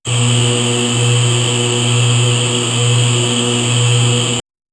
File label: a nice sounding startup sound; system